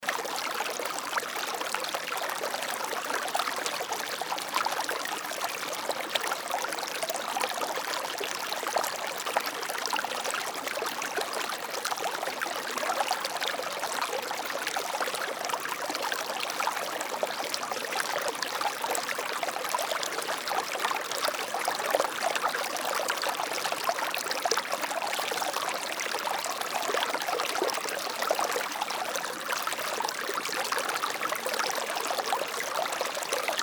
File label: Mountain Stream